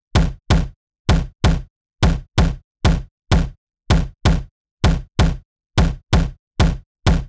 Bigroom Kit F 128bpm Toms, Drums; EDM Drums; Drum Kits; Stems; Ghosthack; Ultimate EDM Drums; BigRoom Kit 02

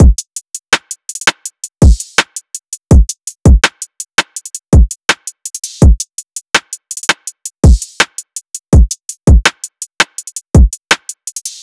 Drum Loop - 165 BPM; Reflection; Cymatics; King Drum Loops

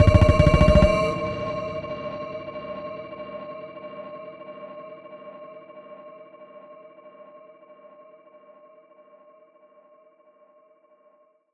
Voice Tweak D# 140 BPM, Psytrance; Voices; Tweaks; Ghosthack; Psytrance Visions Volume 2

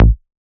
Raw Bass A#

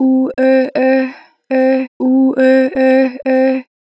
Ooh Eh Eh - 2 bar vib, Natural Vibrato; Vocals; Harmor Vocals

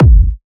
Techno, Kick; Techno Sounds 2024

